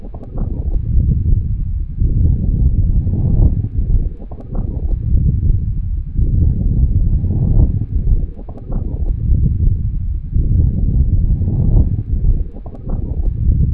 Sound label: speed wind; matmos interactions